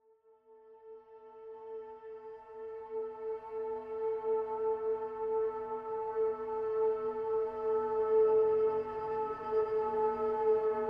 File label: Tension Mood; The Last of Us